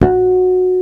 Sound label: D3, ELEC.BASS; East West - Global Sounds